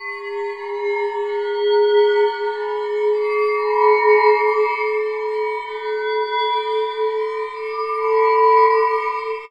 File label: Pad; Dream Zone